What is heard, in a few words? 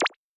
Show Award Bubble; boomerang fu